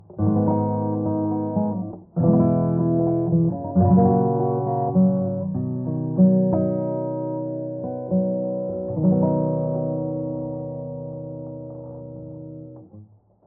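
Valves FILTER LowPass 2 pole CUTOFF 770Hz RESONANCE 60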